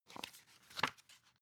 magazine handle

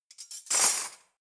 cutlery knives drawer place hard

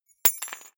key ring small drop parquet hard

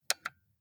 console controller xbox xyab press

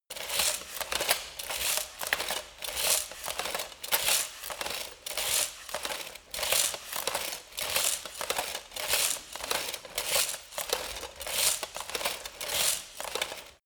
light walk sequence

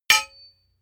hammer hit metal dull

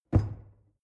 drawer narrow close lock

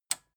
metal button tiny release disable

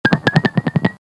guitar cut

